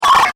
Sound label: Alien Handheld Communicator; Andrew Fleming